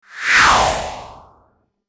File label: portal